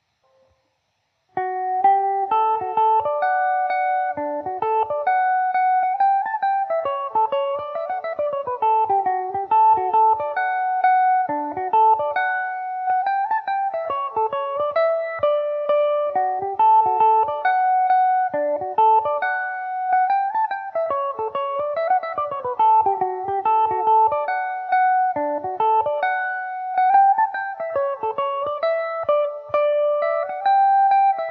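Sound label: barndance D major revelation, Irish mandolin; john mckennas barndance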